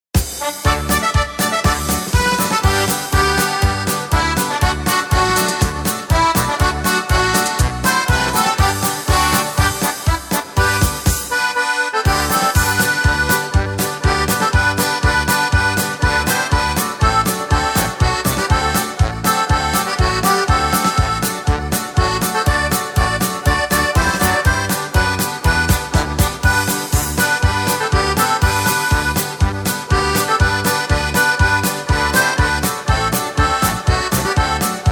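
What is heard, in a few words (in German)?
Pfaelzer Stimmungs Medley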